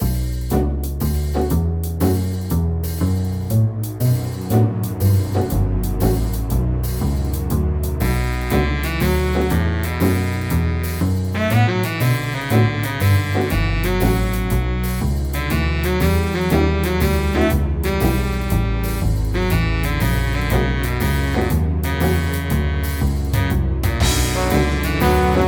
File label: boss lobby